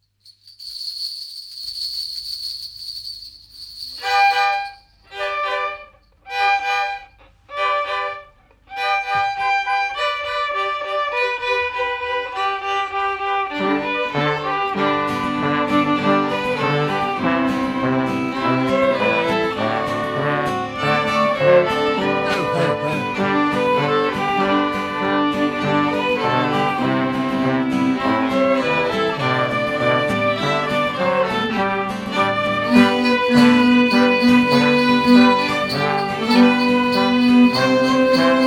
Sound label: The Jingle Bells Mix, fiddle group